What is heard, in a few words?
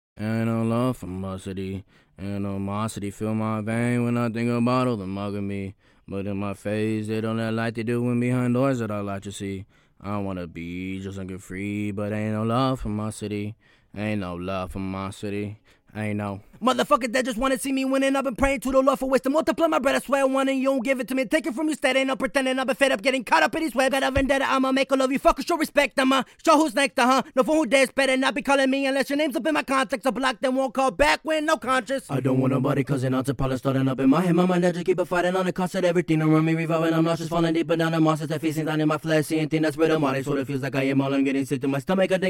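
hip hop 122 C sharp minor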